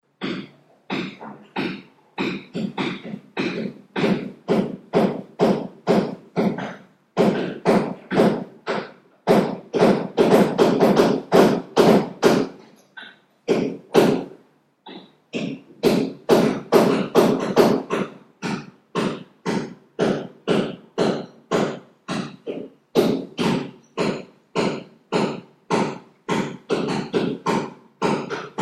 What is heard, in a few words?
noise